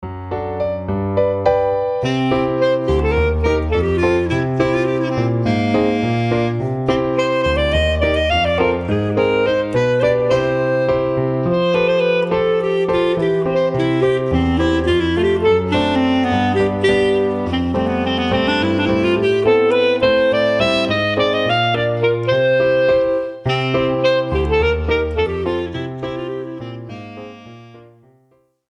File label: Clarinet